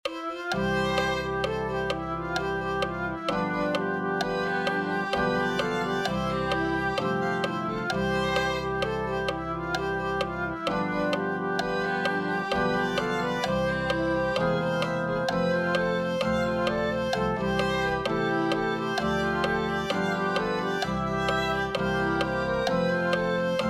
violin

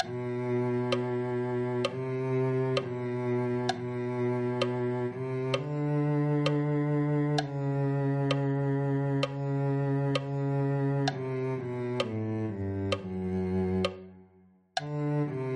cello

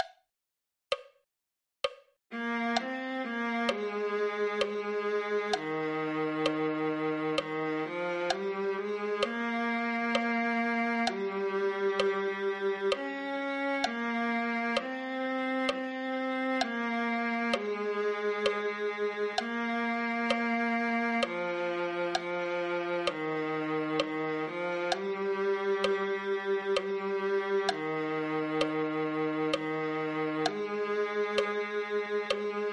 viola

